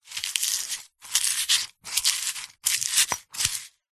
food herbs leaves grinding in stone pestle and mortar; zapsplat